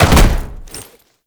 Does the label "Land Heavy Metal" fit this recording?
Yes